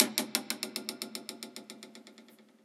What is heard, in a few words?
percussion one shot front door rickety